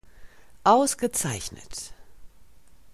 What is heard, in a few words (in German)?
Ton Test